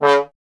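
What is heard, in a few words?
bass trombone C#; Miroslav Vitous; Solo Instruments VOL-1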